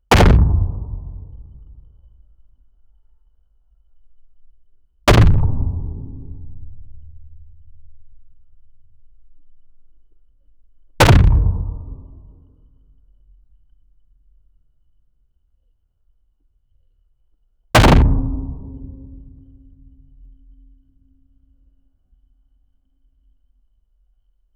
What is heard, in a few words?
giant octopus hits the car